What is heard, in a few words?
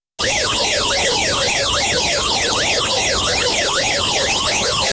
space heroic sound